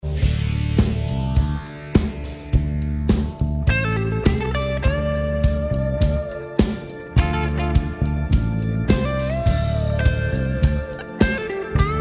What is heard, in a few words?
axefx solo